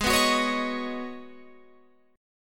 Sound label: G sharp Minor 11th G sharp Guitar Open F, chord; G#m11 strummed